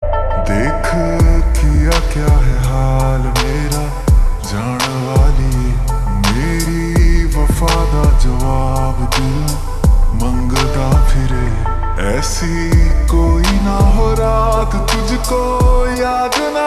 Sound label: Slow Reverb Version Ringtone